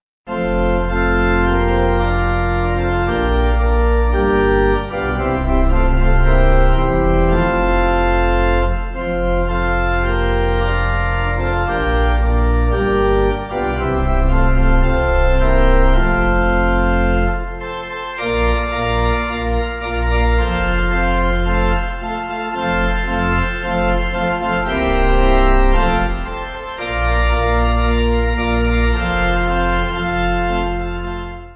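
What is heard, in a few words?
Pipe, Pipe Organ; F. Belden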